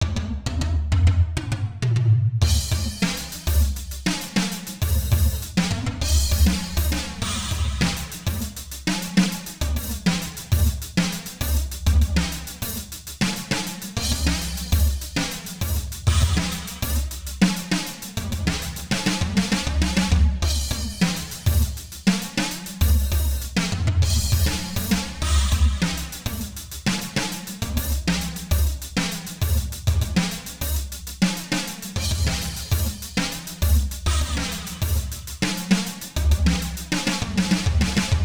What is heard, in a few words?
real drums